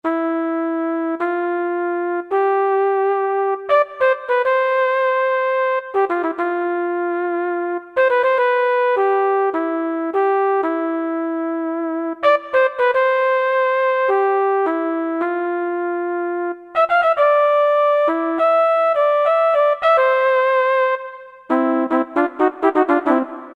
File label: Velo Flugel, Korg N364; Factory Prog demos